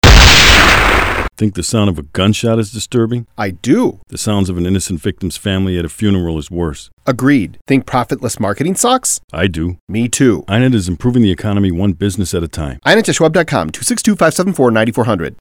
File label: Gun Shots; Sheriff